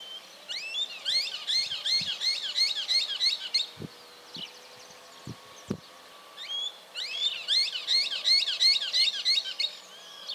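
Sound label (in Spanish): chinchero grande voz, aves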